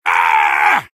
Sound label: soldier taunt head exert; video games; source engine; tf; taunts